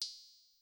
Cym; Sonic-Potions LXR; Pop

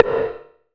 rim, gated reverb; musicradar

